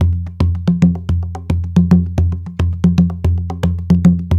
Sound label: 110 JNKNO, Junkanoo; Spectrasonics - Supreme Beats - World Dance